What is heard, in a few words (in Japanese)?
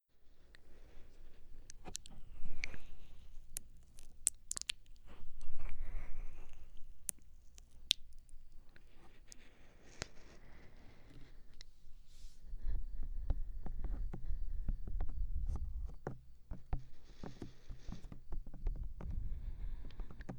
じっくり吐息と耳舐め